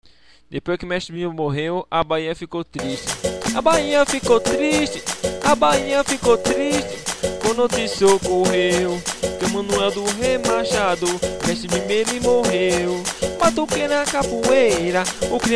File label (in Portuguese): solo capoeira; bahia